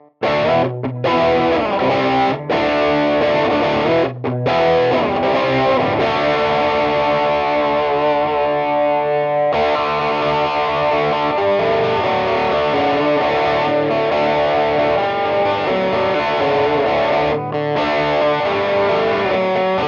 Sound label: 70s Chorus Overdrive; Scuffham Amps S-Gear